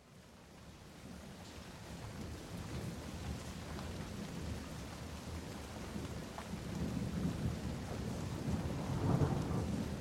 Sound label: boxted hertfordshire thunder storm